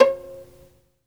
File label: SOLO VI, Synclavier Strings; ILIO